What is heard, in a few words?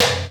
TEK P, TECHNO, Drum Base; 90 sSampleCDs; Crypton - DrumBase5000